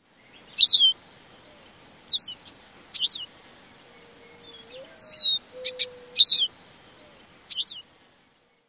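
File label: Lesser Goldfinch, calls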